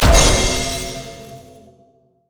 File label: vortex; LoL; diana skin02